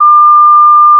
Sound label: short sound that, call end